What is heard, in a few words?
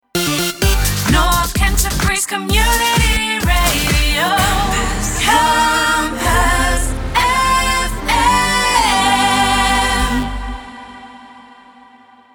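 Sung Jingles C major 128bpm Slogan; Compass FM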